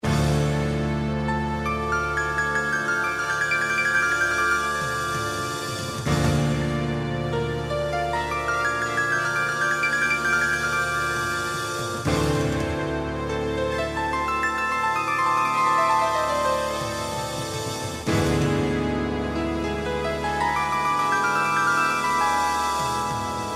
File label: Rock Sonata